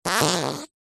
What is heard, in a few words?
human fart quick dry; zapsplat